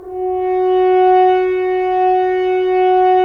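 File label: Solo French Horn; Roland - LA Composer vol. 4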